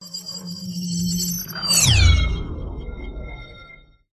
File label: whoosh; Audio Mangler